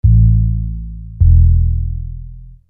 Sine Bass; Construction Kit; HIP-HOP; Sonic Foundry (Sony Creative Software)

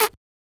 Beatbox; neuro; Sinistarr

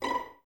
Violins B5 Pizz, String Ensembles; Miroslav Vitous; 11 Violins

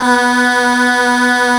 choir; Best Service ProSamples vol.55 - Retro Sampler; AKAI

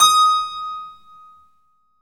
KEY HRPCR, Roland; Roland - LA Composer vol. 1

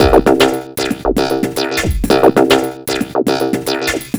Bakelite Beat, Composite Beats; Sonic Foundry (Sony Creative Software); Myths of Technology

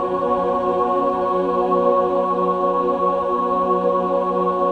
SUS F#4, SUS.CHORD, Symphony of Voices

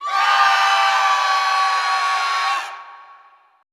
SCREAM FEM, Classical Choir; Best Service; Extended Classical Choir; CLUSTER

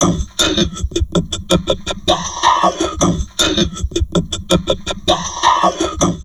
TALK, Drum Loops Crazy Processed

